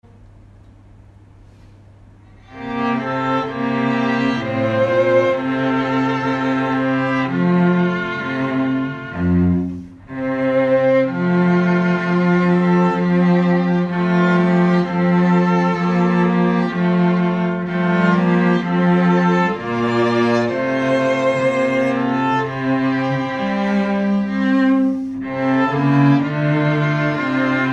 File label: string quartet